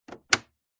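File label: household dehumidifier plastic filter door close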